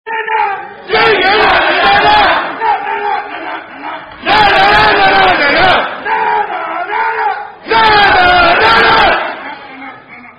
Song; Leighton Buzzard Song; Lady In Green, Lady In Red, Etc.

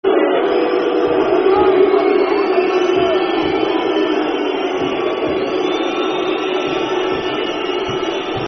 Boo, Football Chant (Soccer Song), N. Ireland

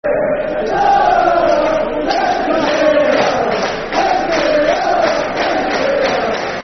Football Chant (Soccer Songs); Leicestershire La La La; Leicester City Lcfc

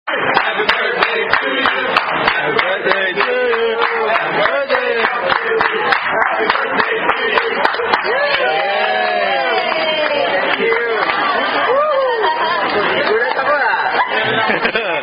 Happy Birthday (Standard) Sung By Wait Staff; Dads 57th Birthday At Chilis

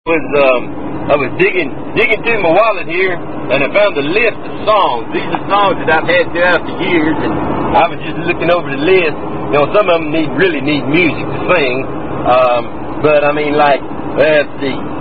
Funny Songs; More Funny Songs While Repoing; Passengers Will Please Refrain; Under The Bamboo Tree; White Shotgun

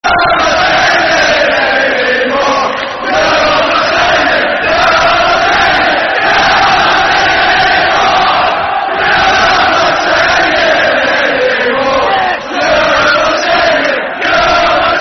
Football Chant (Soccer Song); Your Not Singing Anymore; Gillingham Gfc

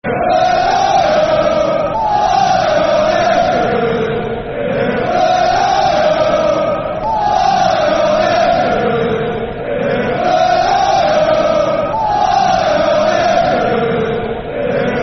Football Chant (Soccer Songs); Aye Oh Hereford; Hereford United Hufc

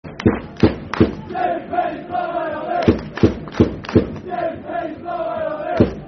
Football Chant, Darlington; Dave Penney's Black & White Army; Darlington Dfc

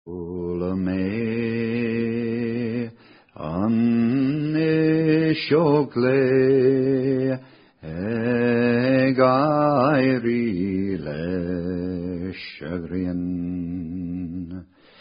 Singing Bird Irish

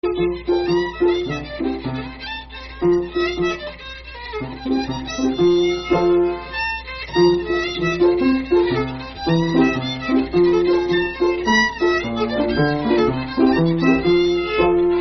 6/8 F B Flat; Nameless Tune

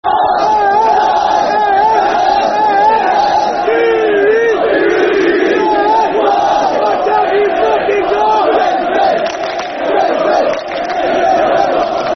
Spell It Out Football Chant (Soccer Song); Grimsby Town Gtfc

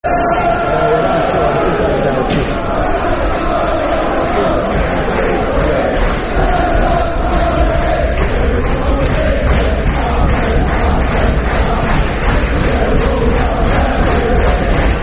Football Chant (Soccer Songs); Let 'em Come; Millwall Mfc

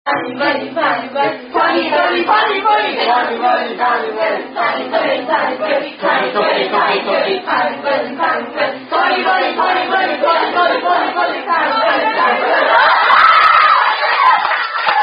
Korean Children's Game; Bunny Bunny

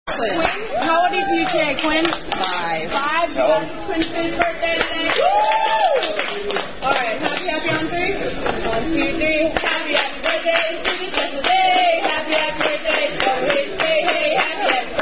Gets Sung To [Happy Happy Birthday]; Red Robin

